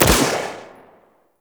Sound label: Pistol Shot; Warframe; Tenno; Tenno Bard Pistol